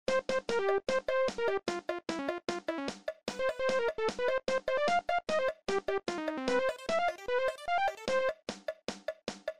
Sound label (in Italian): videogioco